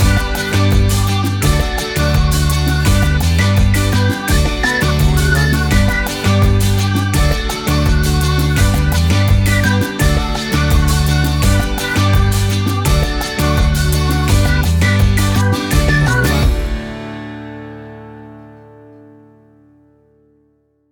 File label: Calypso Reggae Fusion